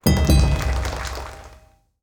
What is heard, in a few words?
debate win